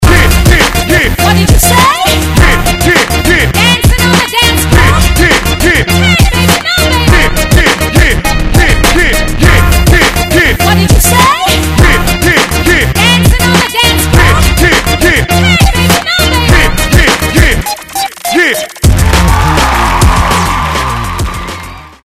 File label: digital soul 102bpm